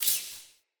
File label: Player Floppet Vocal Impact, Animals